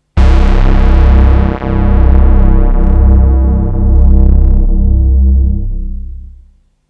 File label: laser harp